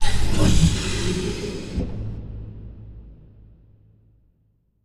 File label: Power